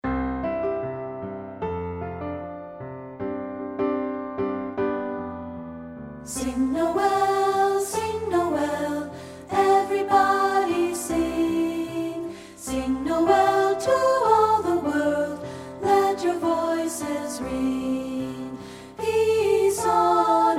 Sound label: VOCAL